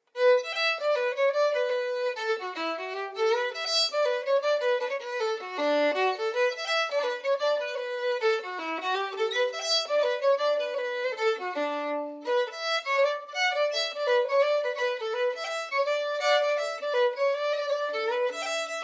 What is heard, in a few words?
old concertina reel vite